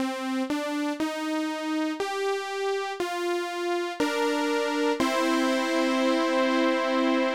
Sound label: victory